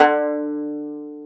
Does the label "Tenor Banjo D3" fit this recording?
Yes